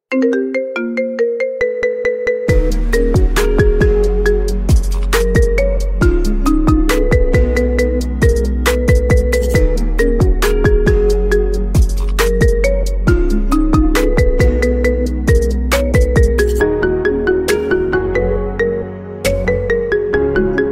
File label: Marimba Ringtone